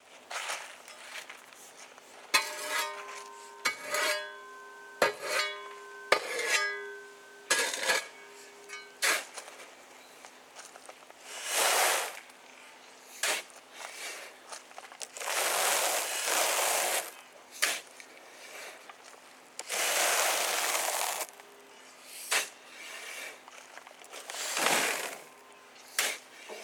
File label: Shoveling sand